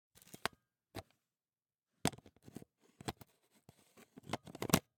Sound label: Camera, Pentax KS2, DSLR, mechanical, removing lens, replacing lens, twist, unlock, lock, XY; Eiravaein Works; Vaeyan IV